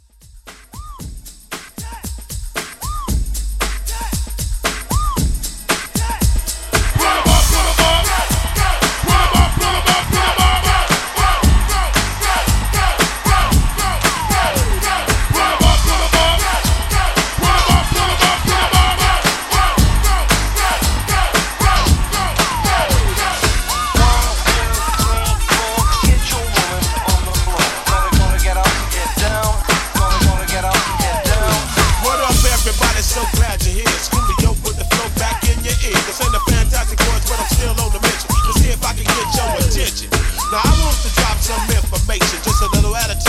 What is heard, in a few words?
90s Old School Hype Re Drum 115